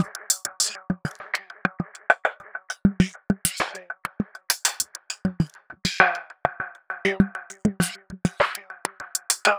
voice dubs, 100bpm; transx loops

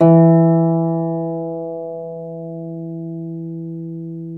Troubador Harp

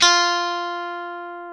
guitar, e-guitars

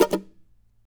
NOISE, guitar; Spectrasonics; Hans Zimmer Guitars VOL-2

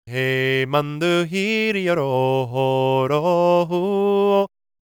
GAELIC, World Voices; ILIO; Vocal Planet

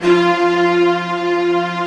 fast strings, Fast octave; Optical Media International; Sonic Images Library

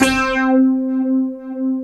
OOH FNKY C4, Synth; FOOONK

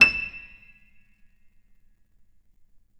PNO FF; Synclavier Sampler Library

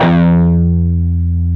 PRS PWR F#2C, Guitars; PRS PWR CUTS

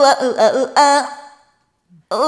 Rhythmic G

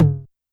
Tom Analog, YAMAHA RM1X

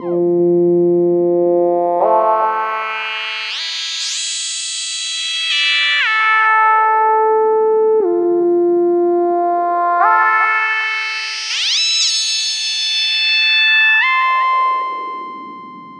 Synth, Modular Electronica; Sonic Foundry (Sony Creative Software); TSS Autopilot

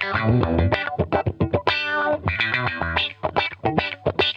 Funk Guitar; 90 s Sample CDs; Zero G

